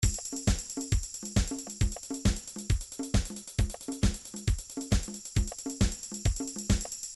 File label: trance midi loops beats